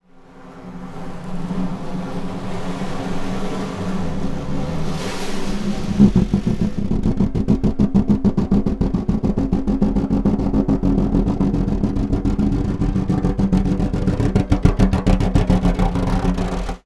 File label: Snare Drum; Percussion Samples